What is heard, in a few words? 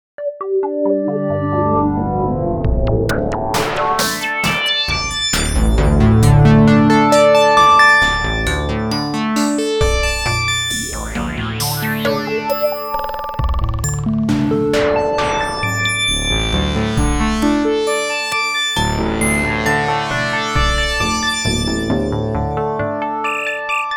Synth